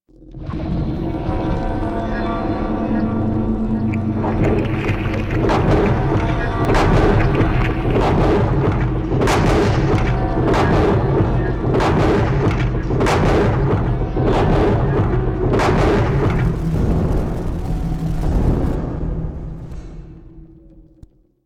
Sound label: Background Machinery C; Warframe; Ambience; Grineer; Grn Boss Room 1